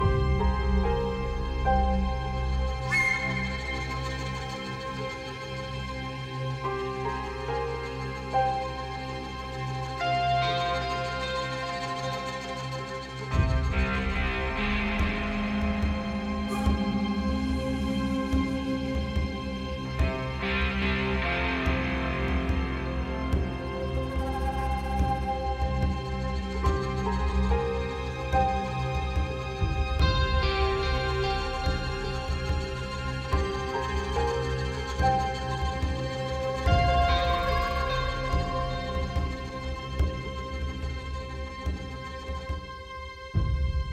Amb loop; Boarding the Ship

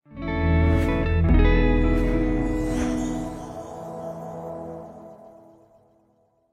Completed; Rocksmith

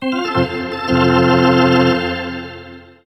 Organ; NHL FaceOff 98; PS1

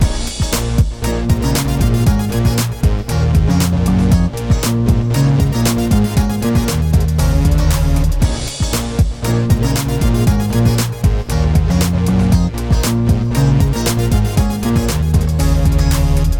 disco; lego the hobbit

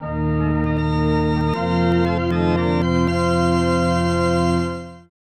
Level Finished; Super Ghouls 'n Ghosts prototype; snes gamerip 1991

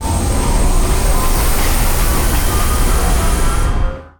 engine start; Star Trek Adversaries

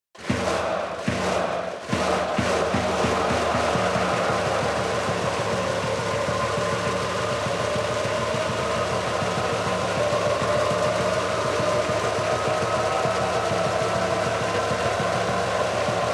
Music Ouen Event; ebaseball powerful pro yakyuu 2020 ps4 switch gamerip 2020

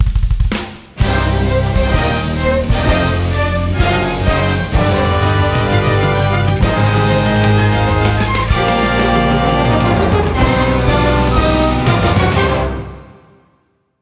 Minor Win Full Fanfare; Regalia of Men and Monarchs

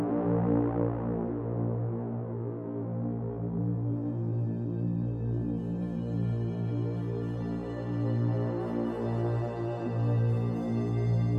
Loop 108bpm; Oprever; jenny leclue detectiv

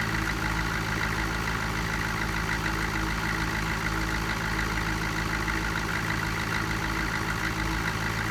vehicle military idle; teardown ps5 windows xbox series xs gamerip 2022

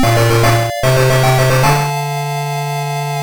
Level Clear; blade eagle 3-d; master system

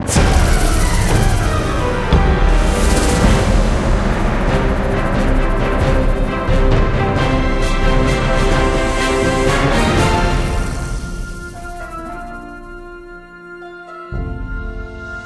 Success Sting; Jurassic Park The Game

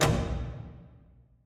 music short cut

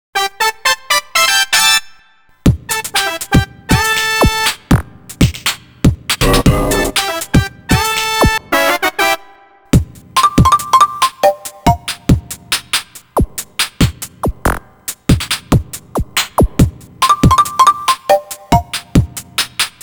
Funny, Funny - Shuffle Game; Irozuki Tingle no Koi no Balloon Trip Special Sound Track

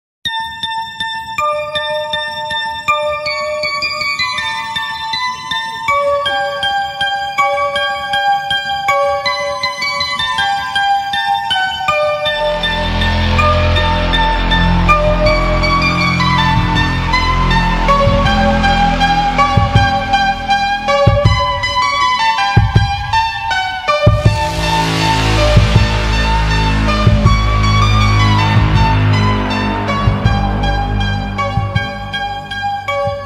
Ice Cream Truck Music; Ice Scream

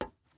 glass step

